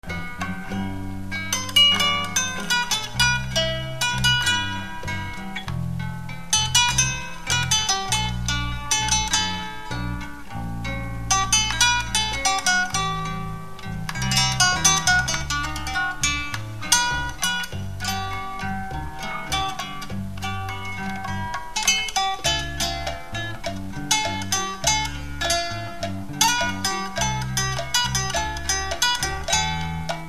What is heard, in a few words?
guit